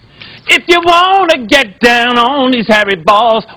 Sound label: death; quake2; male